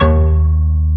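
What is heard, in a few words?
harm D#2, piano; AKAI S-Series CD-ROM Sound Library VOL-2; PF MIX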